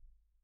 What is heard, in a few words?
Kick In